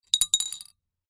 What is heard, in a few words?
foley glass marble drop into empty glass bottle; zapsplat